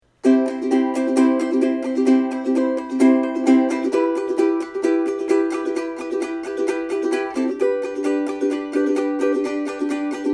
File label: Strum, ukulele